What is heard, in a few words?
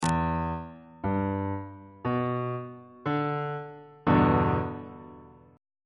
g major 6th 3rd inversion on bass clef